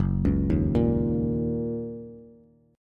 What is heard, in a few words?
Gb 6th Gb Bass; Gb6 arpeggiated; JGuitar